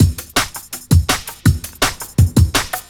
electric zoo drums (165 BPM)

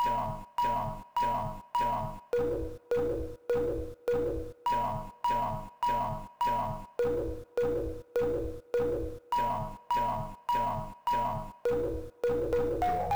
beeps; ytpmv

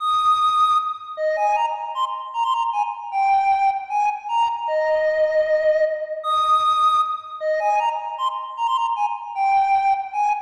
pot shop (lead)

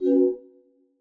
ui Advance

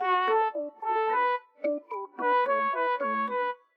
iso brass

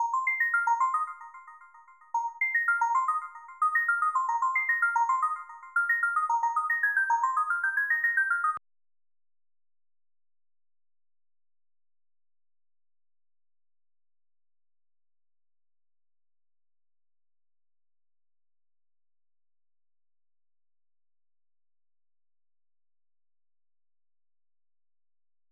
Sine Synth A

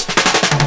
drums (buildup 175 BPM)

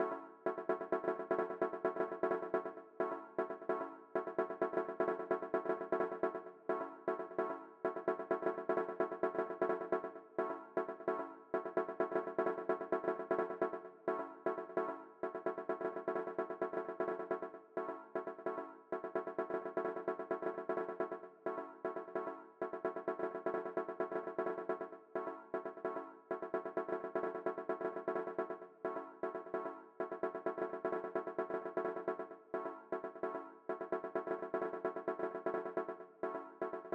soundfont player synth Low; fl

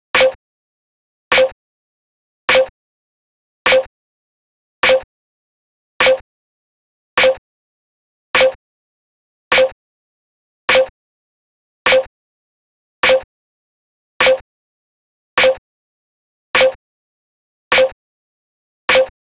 Snare

